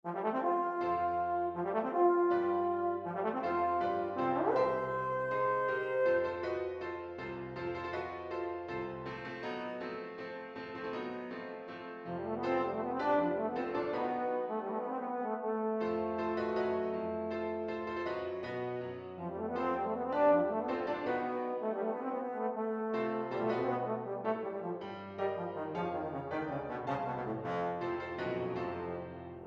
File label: Concerto for Euphonium, piano accompaniment